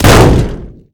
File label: turnbuckle car front